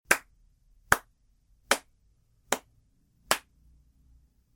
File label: clapping alone; human